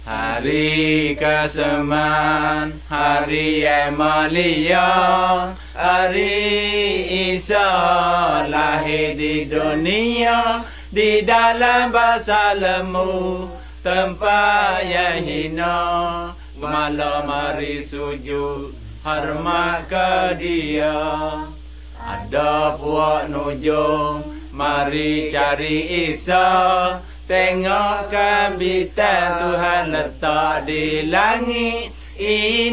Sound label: songs malay pattani